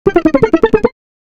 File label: anime comedic transition; mistake